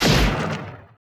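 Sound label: laser gun; Total Control - Action Cutz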